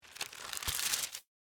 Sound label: Bible Flip Pages, Bible, Flip, Through, Pages, Book; Foley; Books; Offices; BlastWave